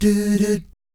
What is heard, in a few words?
DOO DOOT, Vocal Planet Jazz